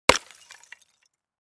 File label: Clay Chimney Vent Hit, concrete & brick